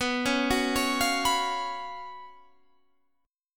Gb Major 7th Suspended 4th B, guitar

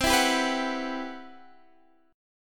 C Major Sharp 11th, Guitar Half Step Down

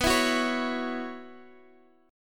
B Suspended 2nd Suspended 4th B, chord

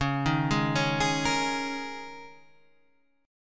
Ab Suspended 2nd Suspended 4th Db

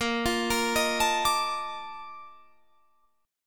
Bb Major 11th Bb down Guitar Standard; arpeggiated; JGuitar

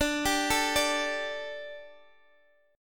D Suspended 4th D, Guitar, Open D

